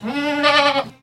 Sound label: Goat Baah Neigh Close; goats